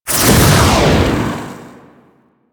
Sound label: Tear Dissolve; Warframe; Entrati; Swarm